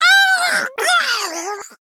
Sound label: murloc knight death